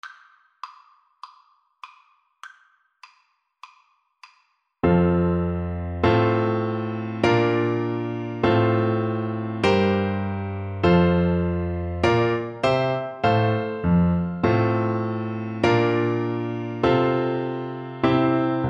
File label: horn, French Horn